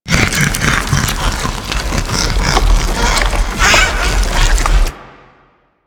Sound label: ape prelate escaping loop; EndlessCapture